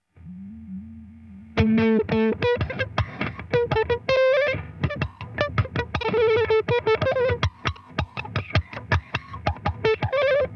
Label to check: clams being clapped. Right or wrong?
Right